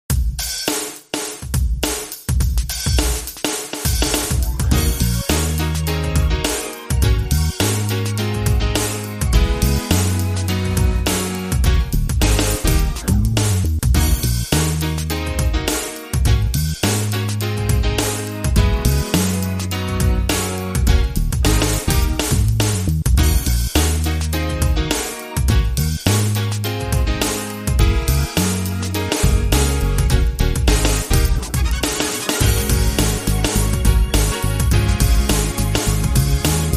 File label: Phunky Horn, Underscore